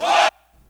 Chant

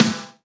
Claps & Snares

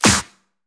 Snare; Vengeance Samples

